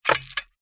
chain thick hanging section swing into wooden post; foley